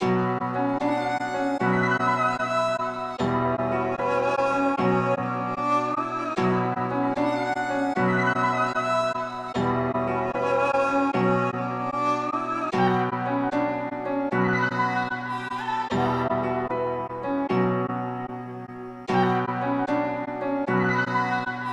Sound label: 151 BPM B Min, Melody, Trap; Cymatics; 2022 Melody Collection; Trap Pt 1